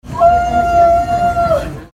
woooooo

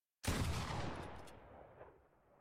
low sound quality pump

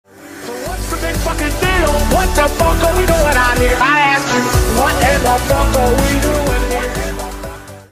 news song

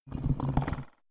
rattle; scp-173

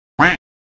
quack sound effect; Voicemod

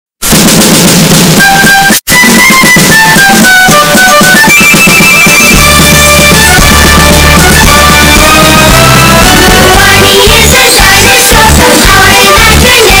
THEME SONG EAR RAPE BASS BOOSTED; BARNEY

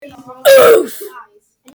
ooff

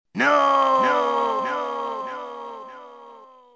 lose

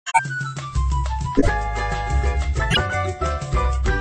iphone ringtone

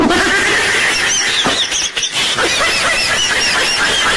dog giggle

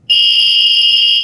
Genfare Farebox Audio

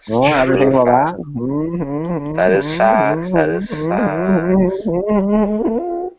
hmm

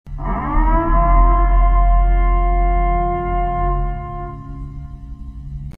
Purge Siren

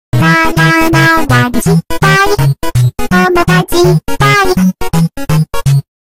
dancing in shower music; turtle

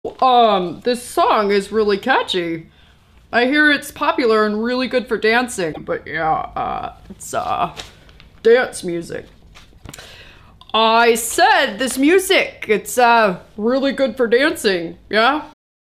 dance music

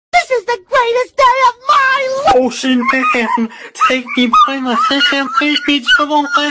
voice crack

